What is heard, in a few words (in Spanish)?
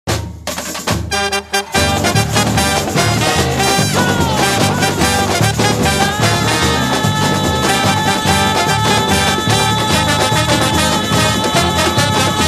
Marcha tribunera; Sapucay 2022; carnaval; corrientes